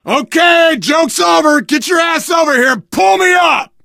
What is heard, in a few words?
ledge hang, biker; video game